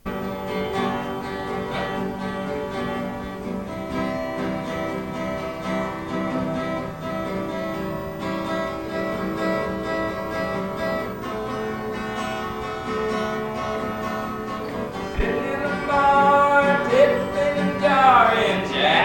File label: Country Honk